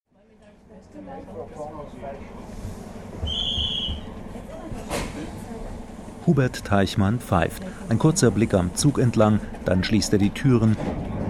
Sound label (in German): staudenbahn